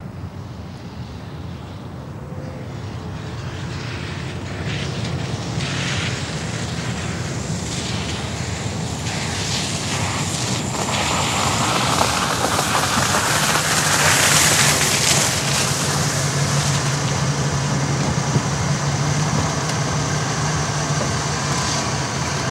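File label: Car Stop Idle Snowy Road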